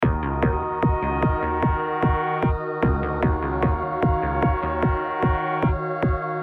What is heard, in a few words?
Short mistery